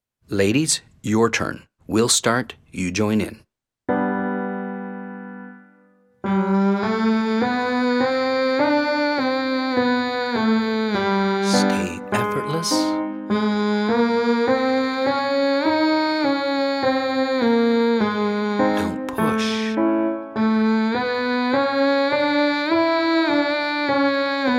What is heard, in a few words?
Humming 5 Tone Scale Female; Full Demo Affirmation